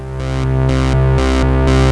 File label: PAD, Siel DK-600